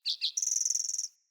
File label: Bird Song; Pothia Bird Guide